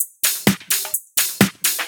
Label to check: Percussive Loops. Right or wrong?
Right